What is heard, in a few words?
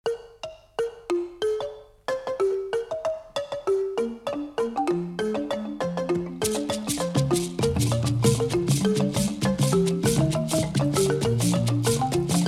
mbira